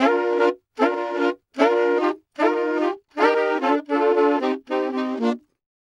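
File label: Sax Section 076bpm Bb, Saxaphone; Quazibeat; African Secret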